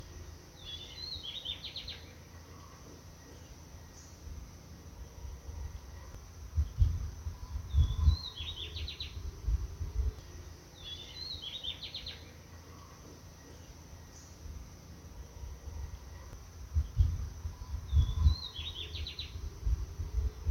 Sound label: pheugopedius rutilus